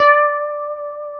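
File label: D 5B, compressed Strat, guitars; Spectrasonics; Hans Zimmer Guitars VOL-1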